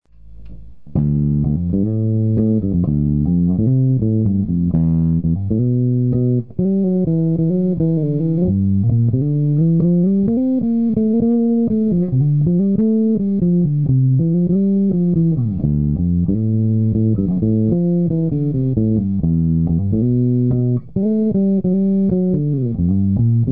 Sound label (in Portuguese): solo de baixo